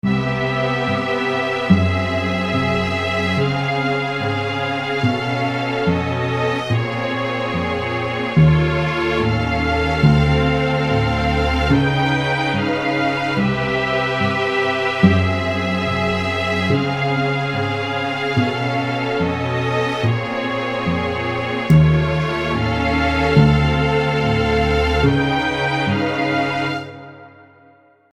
modulation

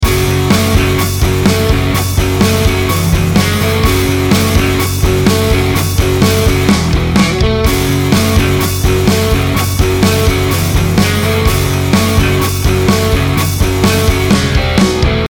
power chord

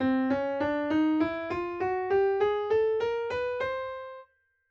chromatic scale

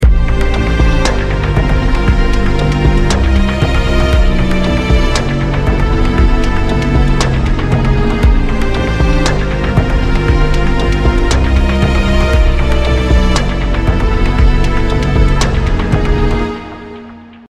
fourth voicing

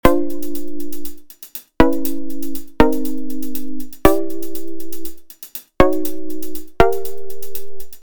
env synth short decay

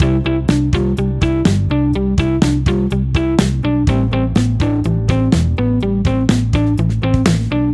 synco 8th

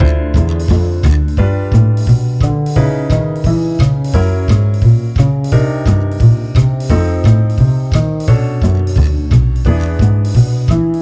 chain min2down

